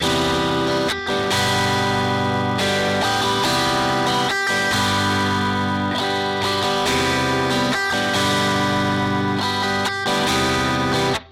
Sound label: guitar